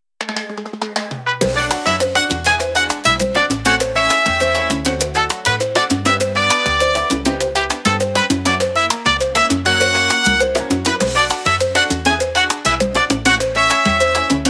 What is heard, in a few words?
Latin, ringtone